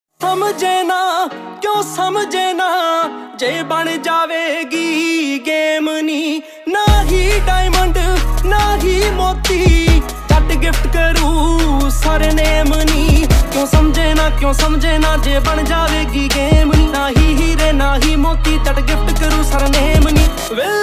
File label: DJ Punjabi Ringtone